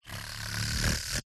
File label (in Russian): zvuki volshebnoi fei